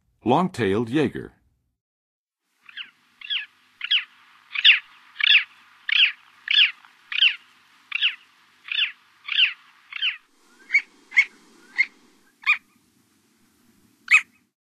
Long-tailed Jaeger, Birds of North America; Western USA; Western USA Songbirds

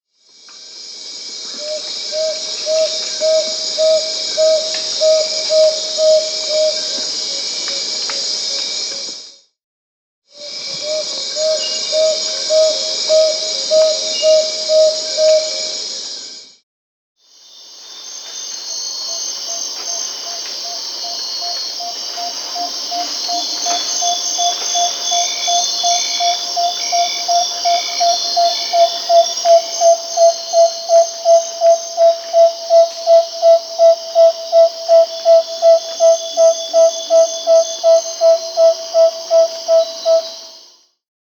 Tawny-bellied Screech-Owl (Otus watsonii), Peru; S. America

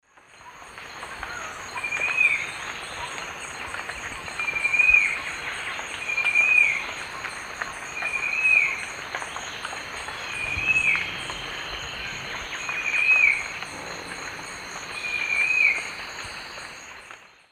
Pygiptila stellaris, song; S. America; Eastern Lowlands

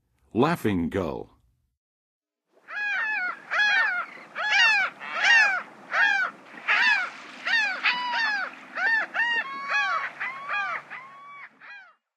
Laughing Gull; North America; Western USA; Western USA Songbirds

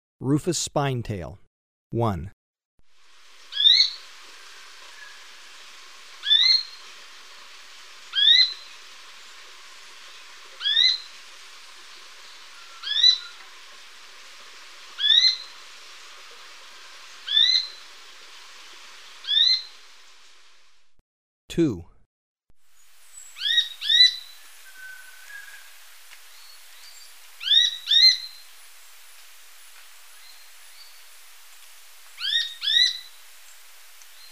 Rufous Spinetail; S. America

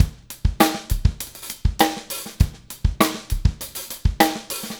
Funkified, Rock Drums

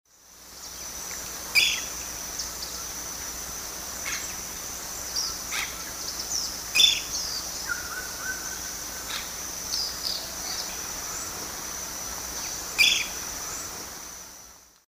Myiarchus ferox, song-like call; S. America; Eastern Lowlands

